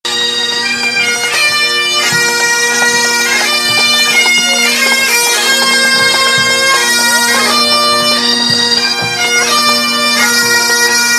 Pipe Band

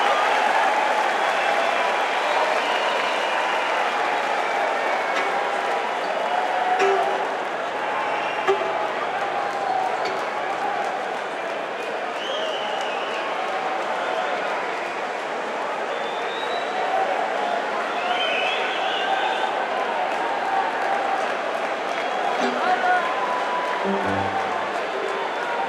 tuning crowd

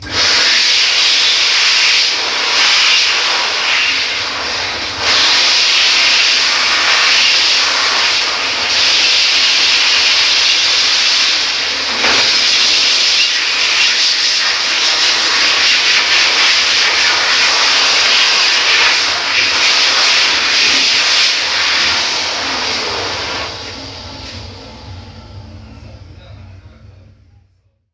hand dryer solos, museum; the british museum bloomsbury

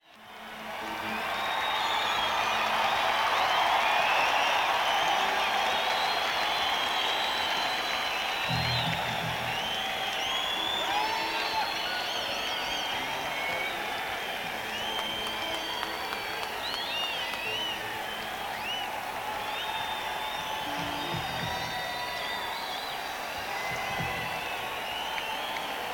Crowd Tuning; nak500

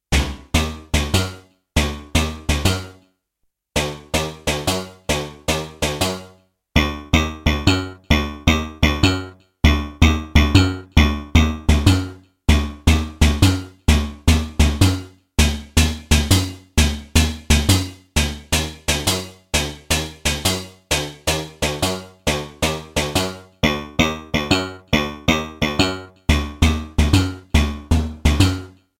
Mutant Bass, Kawai K1; Bank 2 card A

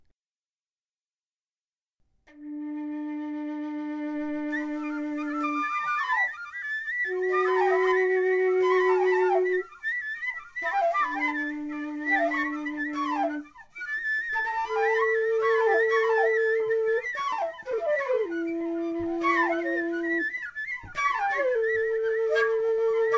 flute

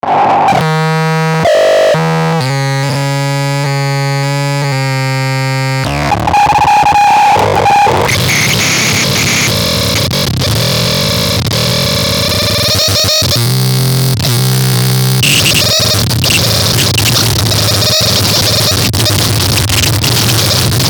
buzz zero volume

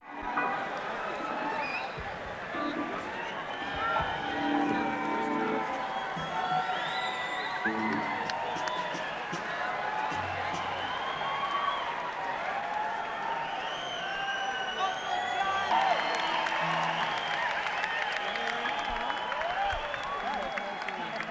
Crowd Tuning

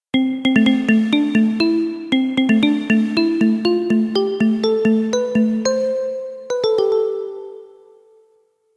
Marimba

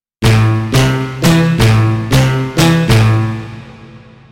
Multi Bass Hits; Kawai K1; Bank 2 card A

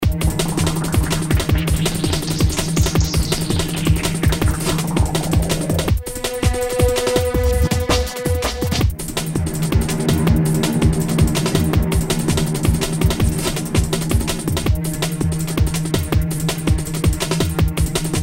drum n cello

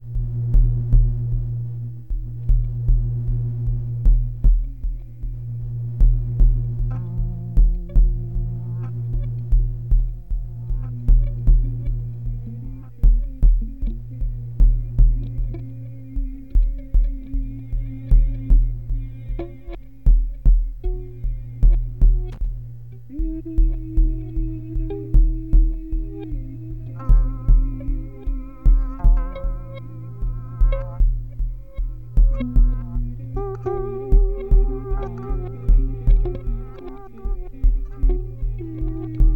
Bass Noise

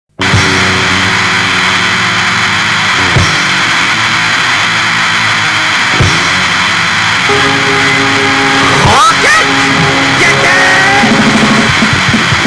Rocket Noisy; krevar; Breizh Dis Or Die 2012

